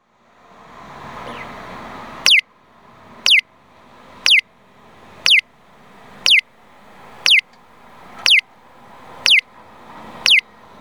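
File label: peep, traffic signal; onkyo kosaten; aichi; inazawa; konomiyaekimae; nagoya U-TP-1; piyo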